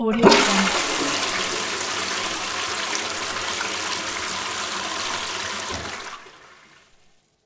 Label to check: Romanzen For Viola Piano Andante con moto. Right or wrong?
Wrong